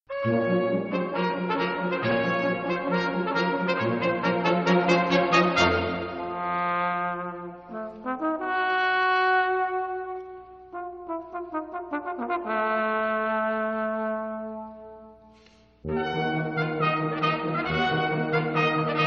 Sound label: Polka